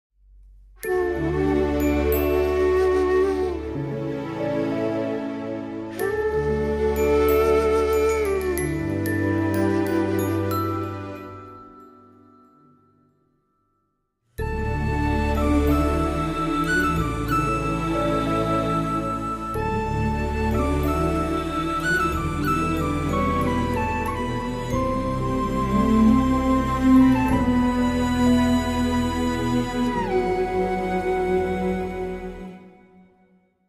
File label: bgm ringtone in tamil